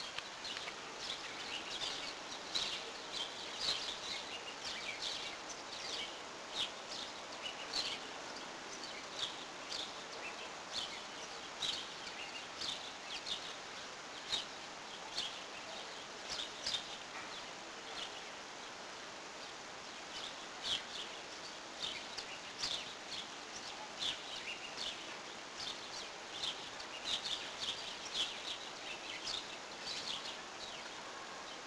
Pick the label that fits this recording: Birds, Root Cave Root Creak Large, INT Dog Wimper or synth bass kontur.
Birds